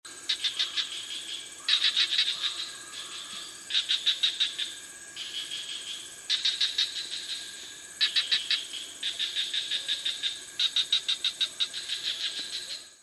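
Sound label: amnirana nicobariensis, Cricket Frog call; Johor, Peninsular Malaysia, 06 Nov 2016, 1730 hr; Ranidae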